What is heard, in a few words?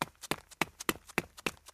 run loop; Gumball - Go Long!